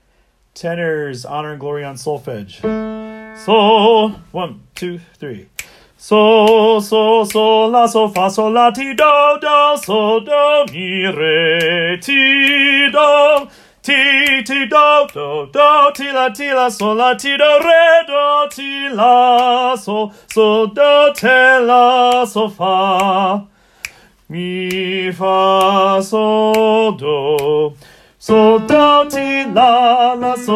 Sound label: Tenor on solfege; Wando Choir Class Placements